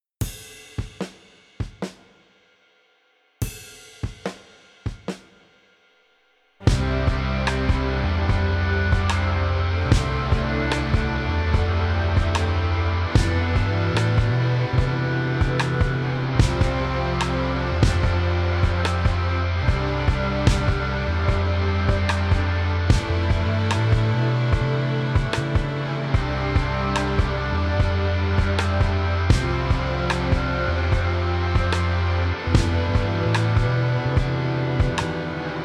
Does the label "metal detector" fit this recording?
No